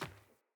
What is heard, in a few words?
Sandals Run Dirt, Footsteps; Player